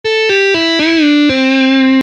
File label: Guitar licks